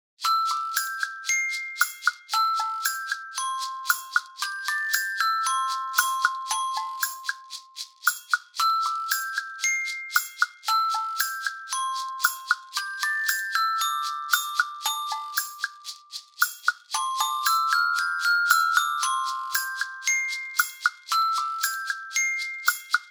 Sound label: chime bars only; Fingers Play